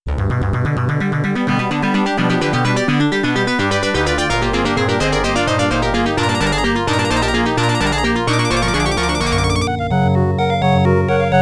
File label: Lap Clear